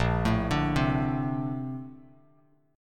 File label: A sharp Major 9th, Bass Standard; arpeggiated